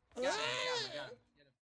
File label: battle cry